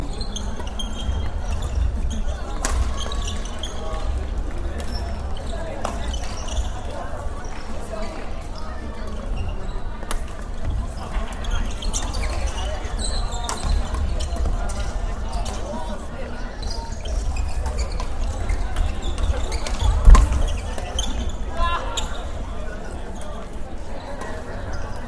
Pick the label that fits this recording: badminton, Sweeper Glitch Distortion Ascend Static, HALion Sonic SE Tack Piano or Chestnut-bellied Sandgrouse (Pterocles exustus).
badminton